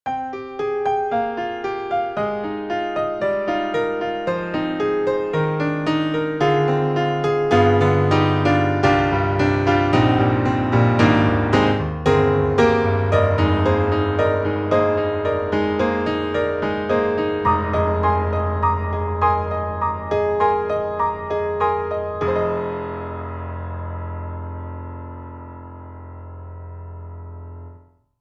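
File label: Yamaha C7 Close West Coast Studio, piano; Steinberg; The Grand 3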